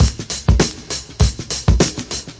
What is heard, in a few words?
100 pingpong the dirt, drum loops; 091 to 100 bpm